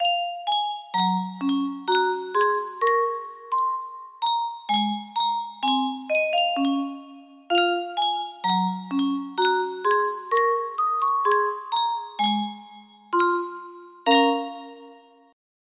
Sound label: Yunsheng Music Box, music box melody